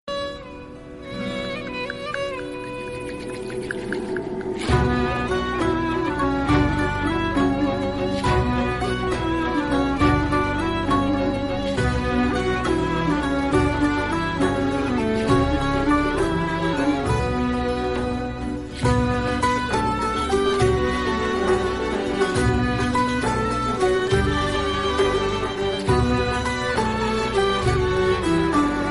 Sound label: turkish ringtone